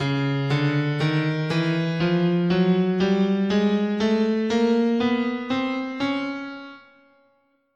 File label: C sharp Chromatic, scale